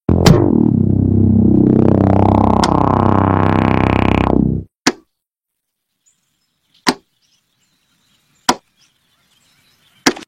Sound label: intro earrape; regular show